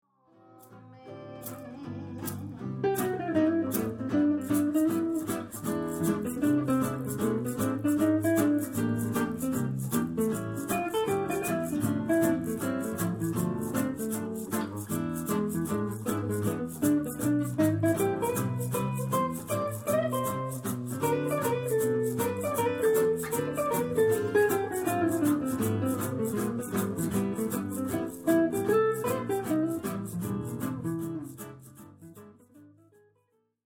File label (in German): Solo Gitarre